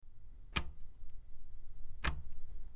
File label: Button Pressed Quick, switch clicks